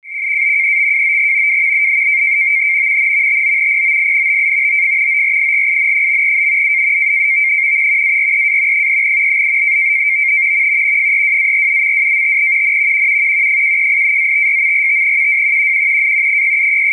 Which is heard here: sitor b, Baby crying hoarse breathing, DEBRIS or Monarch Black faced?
sitor b